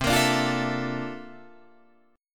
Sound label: C 13th C down Guitar Drop D